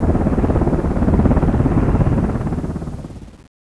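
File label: black hawk distant; ambience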